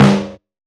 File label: fat snare g key, acoustic snare sample tuned to the G note. Loudest frequency: 629Hz